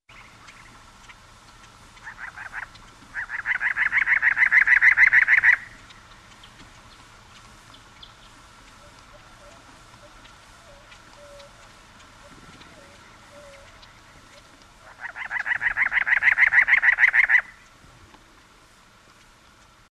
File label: Cactus Wren